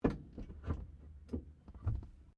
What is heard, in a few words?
Car Holden HD Premier Handbreak Off, Classic Australian cars; 1965; Vehicles